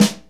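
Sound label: SNR snare, Motown; Northstar; Drumscapes Roland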